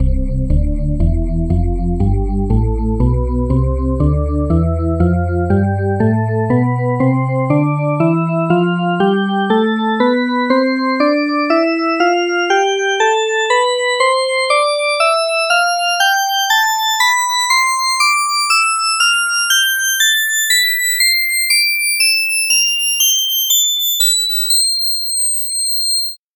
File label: drawbar organ tonleiter